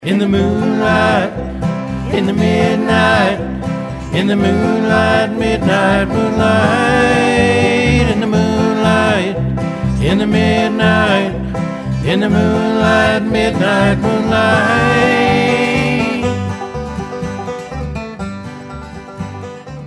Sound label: Country Band; Hartford